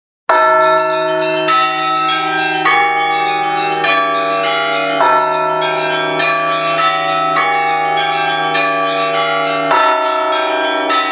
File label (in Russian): zvon